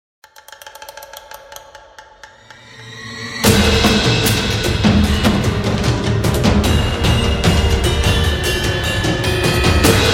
Suspended Cymbals

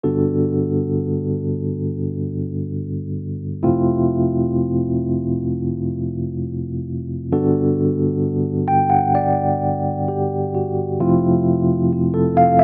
Tremolan - On Rhodes

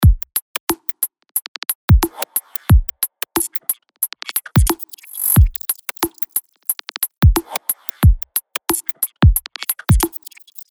90 Drums Full; Mercury

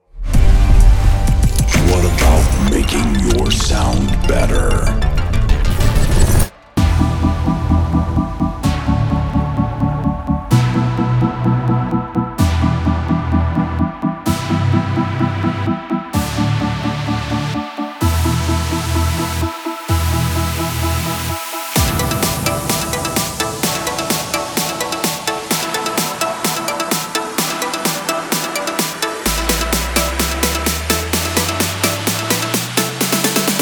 Trance; W.A. Production; Babylon

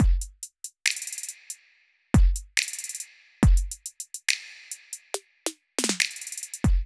140 CAVERN

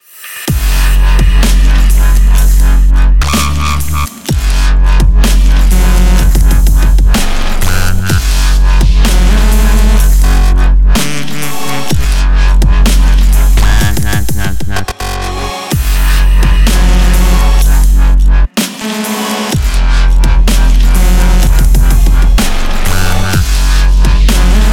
DUBSTEP; Zampler Supreme

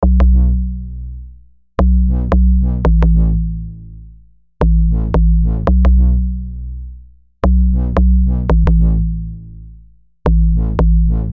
85 B Synth Bass

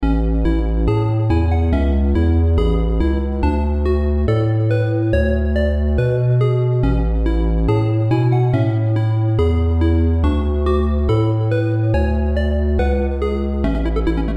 Video Game

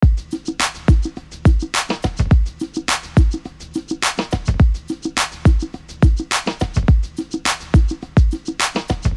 105 Drums Full

